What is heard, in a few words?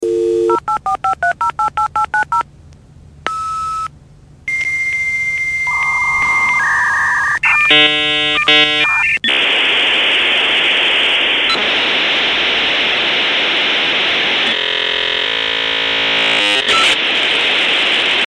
Modem